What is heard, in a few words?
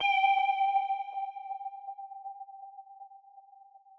Pluck; Fox